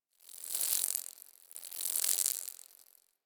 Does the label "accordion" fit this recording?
No